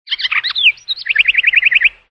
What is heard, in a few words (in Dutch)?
Vogels; ZhenShiXunYouJi2